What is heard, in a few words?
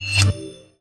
pickup resin, inventory; player